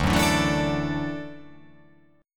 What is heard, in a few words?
D Major Add 9th, Dadd9 chord; strummed; JGuitar